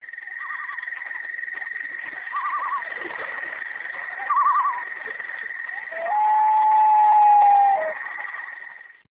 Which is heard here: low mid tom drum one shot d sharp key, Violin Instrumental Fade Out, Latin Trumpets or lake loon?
lake loon